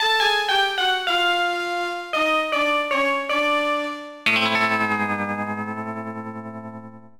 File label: Music Gen Huge Failure